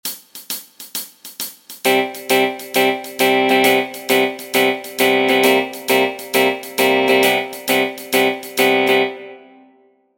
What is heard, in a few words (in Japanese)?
シャッフルビート